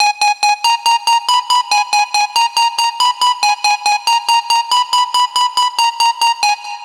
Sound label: 140BPM G#; Vengeance - Essential Clubsounds Vol.4